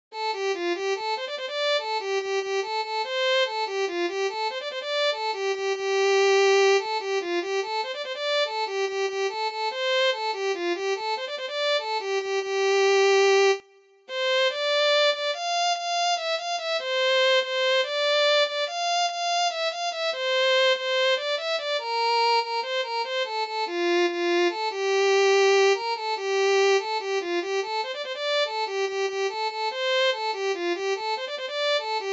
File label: Violin